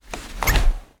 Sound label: household person sit down on dining chair wooden cushioned recorded underneath